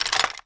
weapon remove; weaponweights content

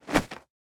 punch miss; gmod; arccw uc commons attachments

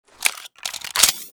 reload

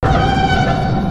republic alarm; defcon; gmod; star wars battlefront 2 galaxy map

